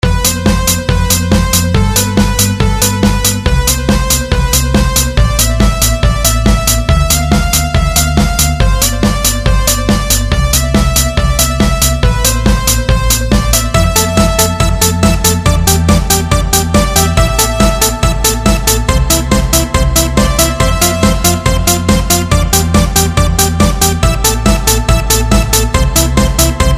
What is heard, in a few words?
Instrumental